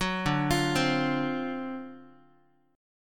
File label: D 7th Sharp 9th, Guitar, chord; D7#9 arpeggiated; JGuitar